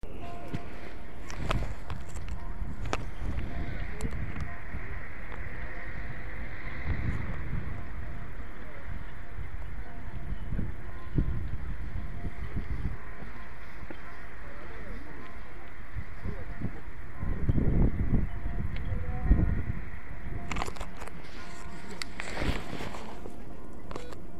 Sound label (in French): Calme